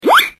Cartoon Slip

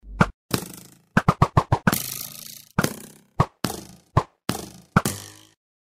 Bow and Arrows; Minecraft

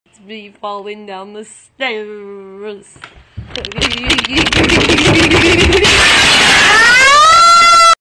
Falling Down the Stairs

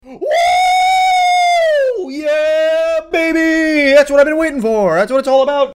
Uh Yeah Baby Scream; Critikal

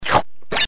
Crash Bandicoot Wumpa Fruit

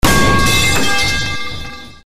Metal Iron Pipe; Jixaw

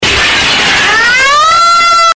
Falling Down the Stairs Crash; Crash Part Only

